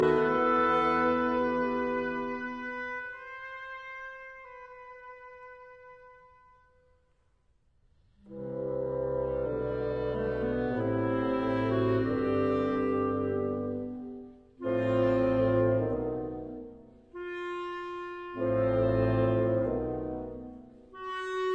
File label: Overture arr for wind ensemble; Rossini; Guillaume Tell William Tell Overture